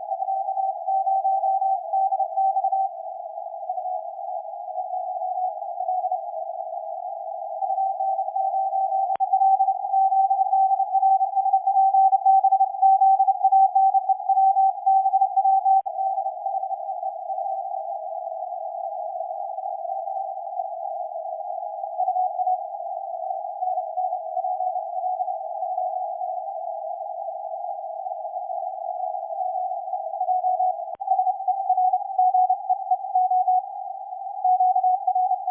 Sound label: APF 80Hz, IC7610